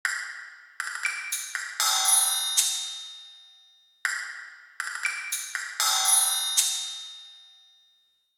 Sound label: Free Percussion; VSL